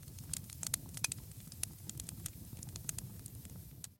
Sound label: campfire log crackle